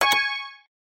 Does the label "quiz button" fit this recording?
Yes